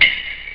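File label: block reverse; jazz jackrabbit 1; M8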